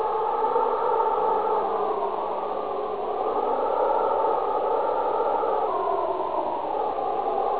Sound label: vent; Freeride